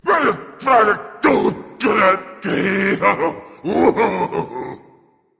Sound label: grumble berserker; cuchulainn